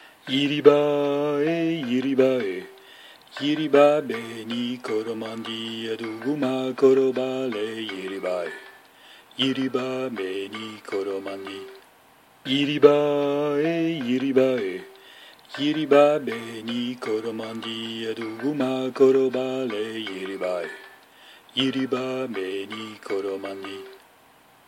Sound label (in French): Balafon Melodie Chantee